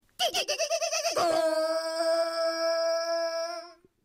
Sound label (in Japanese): 車のエンジン